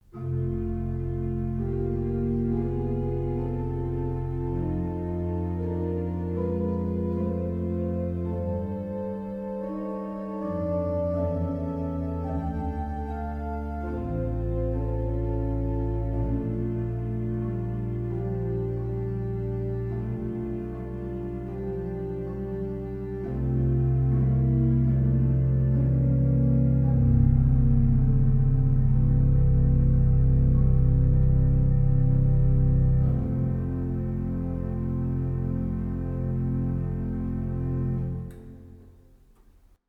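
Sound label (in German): Orgel, pfeifenorgeln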